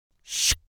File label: Shook transition; HC teaser